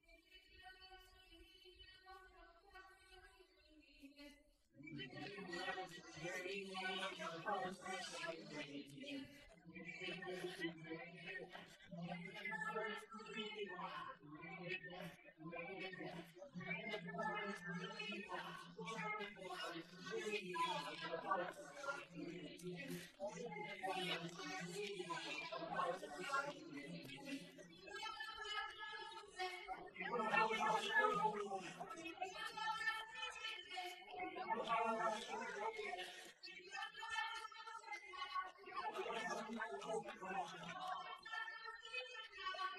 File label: vocals noise